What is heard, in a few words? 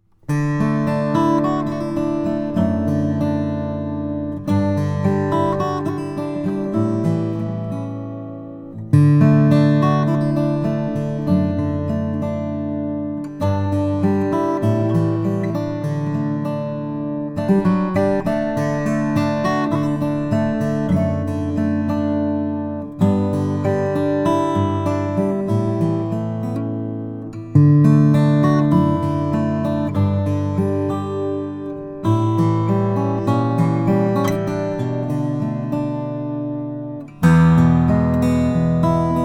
schenk guitar indian rosewood; vince gill